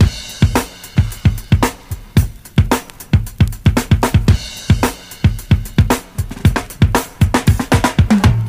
ahso, Drum loops Boombap

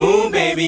"ooh baby" SUNG; ooh ahh

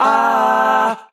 "ah" SUNG, Voice; ooh ahh

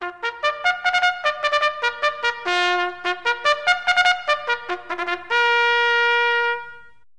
trumpet announcement

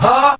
huh group of men

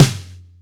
snare, Real

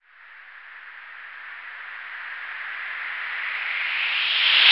here, swish percussion

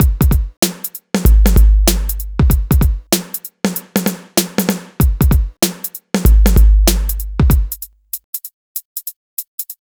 swing, Funky Drum loops

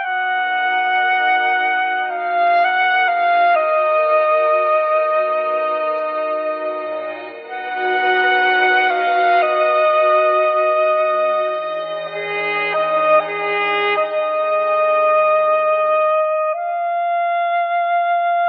sax, sad

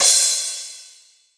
Cymbals

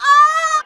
female orgasm

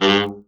car horn

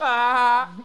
"ah ha"; Voice; ooh ahh

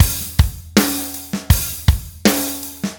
headnod, boombap drum loop; Drum loops

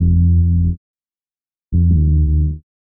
bass, Loops

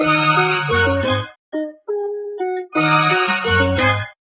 strings and guitar solsa, loops, phonky

